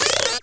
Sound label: chatty creature